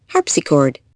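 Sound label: harpsichord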